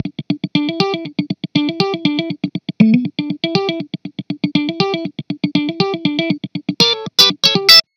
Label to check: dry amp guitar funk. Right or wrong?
Right